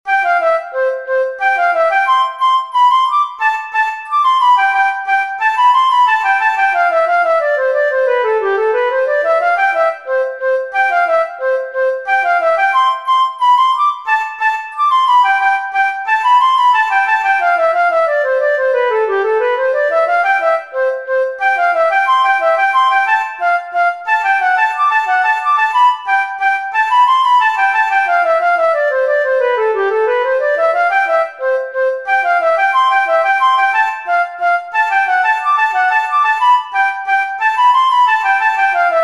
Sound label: Sailors' Hornpipe, flute solos